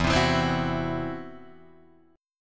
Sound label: E Suspended 2nd Sharp 5th, Guitar, Drop D